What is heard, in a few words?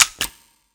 new flashlight; slender